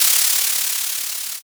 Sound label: fizz